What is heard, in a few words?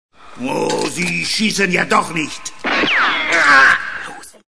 aargh